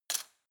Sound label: cutlery plastic drawer place